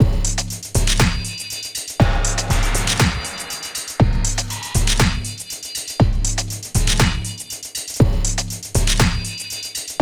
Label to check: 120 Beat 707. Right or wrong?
Right